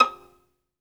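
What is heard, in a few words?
solo violin, strings; ILIO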